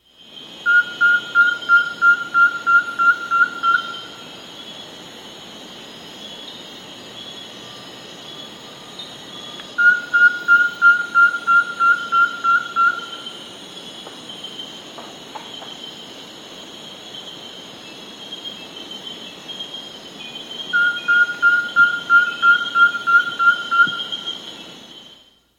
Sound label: Least Pygmy-Owl, S. America